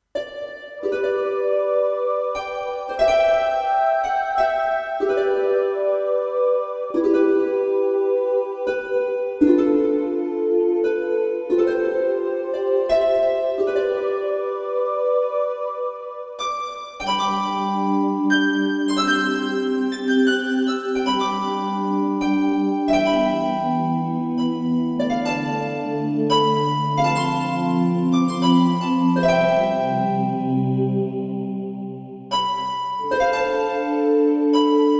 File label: Harp, Holy Music